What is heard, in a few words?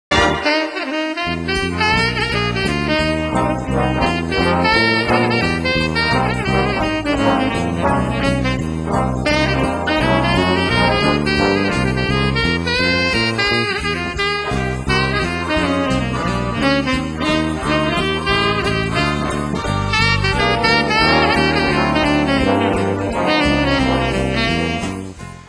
Sax Solo